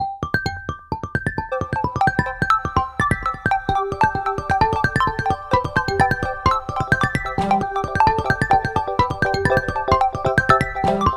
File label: bubbles ringtone; samsung